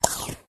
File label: Button Menu Hide; Candy Crush Soda